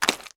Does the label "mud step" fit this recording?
Yes